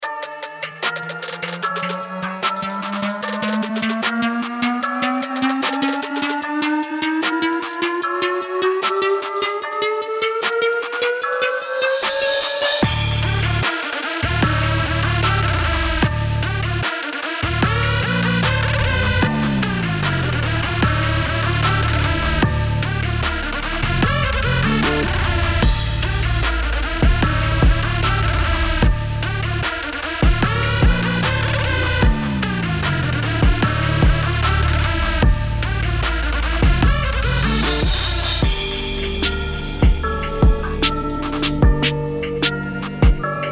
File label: Trap 150bpm